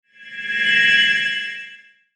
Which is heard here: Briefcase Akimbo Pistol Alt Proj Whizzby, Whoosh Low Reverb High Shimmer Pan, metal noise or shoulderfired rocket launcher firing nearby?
Whoosh Low Reverb High Shimmer Pan